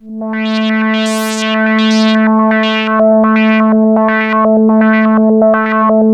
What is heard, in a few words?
ARP 2600, Vintage Keyboards; Big Fish Audio; First Call Vintage Keyboards